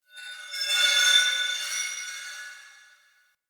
Witch Station Ruins Soul Chime; Ambience; Soul Plane

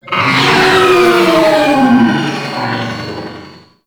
Tau Sentient Dactolyst Pain; Warframe

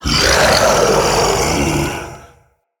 Male Vocal Breath Heavy; Characters; Enemy; Mockeries; SubBoss; Mockery

